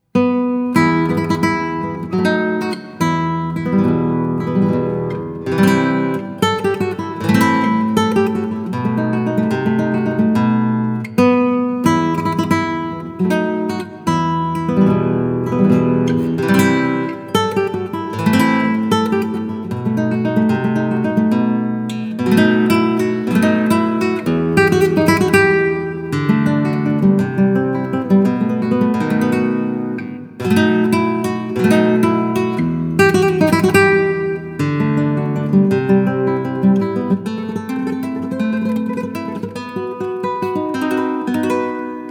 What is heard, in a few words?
Flamenco Solo